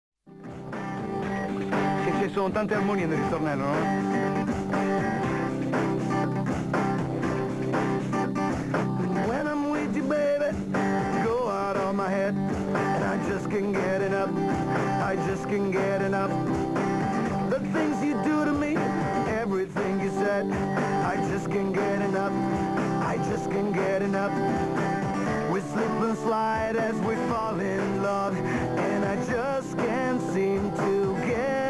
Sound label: Acoustic